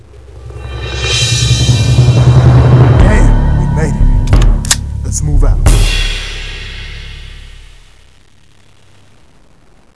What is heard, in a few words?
survivor win; biohazard; cstrike